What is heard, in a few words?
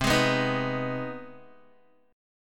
C Minor Major 7th Sharp 5th, Guitar; CmM7#5; strummed